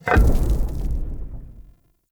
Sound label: Weighted Stone Plate Switch Start; Puzzles